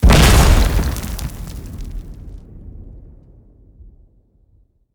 Sfx Rubble Impact

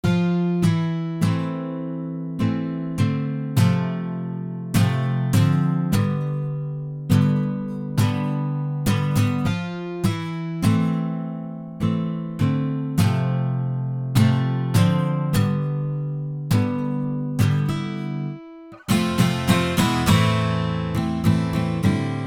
Pour me a vodka [Guitar]; Next Day Survival

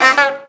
Jingle Finish Bad; tamagotchi connection corner shop ds

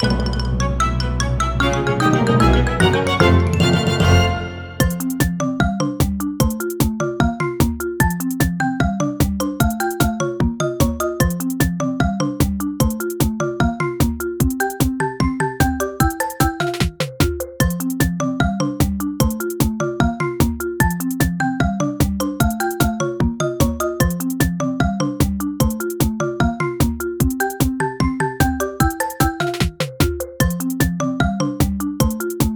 All Clear

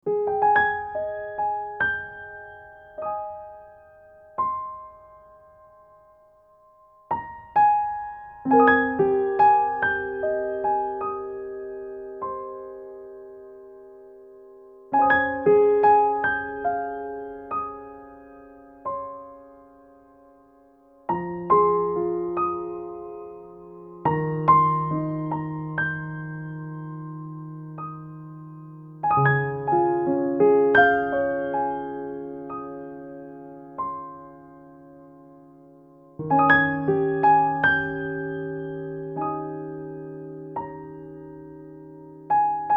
Waking Up (Piano Vers.); Impostor Factory Original Soundtrack 2021

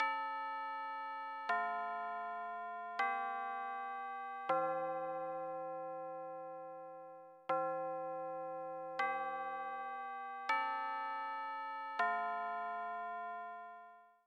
Standard Chime; Metropolismania 2001 PlayStation 2 gamerip

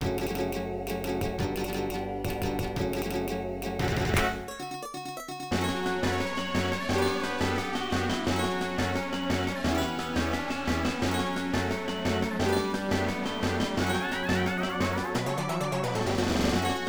Stage BGM; asterix arcade; Spanish Village (Stage 5 BGM -Spain-)

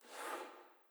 bottom bar appears

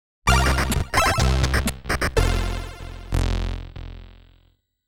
Start Jingle; Giana Sisters; SID Anthology Vol. 2

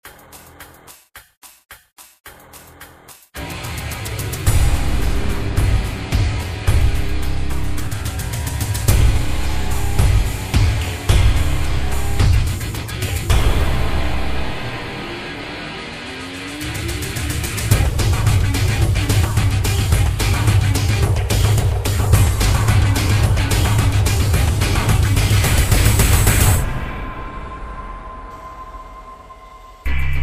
Forboding Industrial; D.I.R.T.: Origin of the Species; windows gamerip 2006

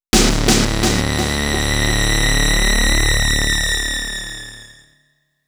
Shock; Clock Tower 20th Anniversary Sound Collection

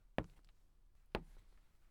SFX Footstep Wood

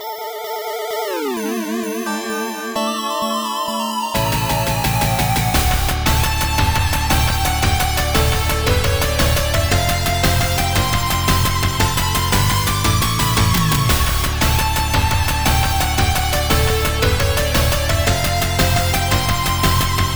Game Clear; Devilish; Genesis

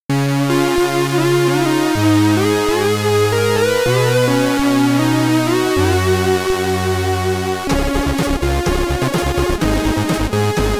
Name Entry (SID Stereo); Cyrus; Commodore 64

